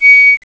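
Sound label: WHISTLE; CMI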